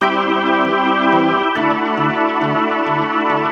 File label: Synt